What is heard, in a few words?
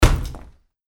karate kick punching bag